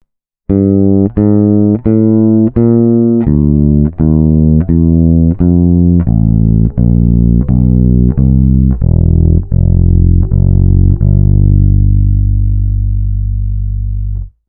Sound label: Jazz Rounds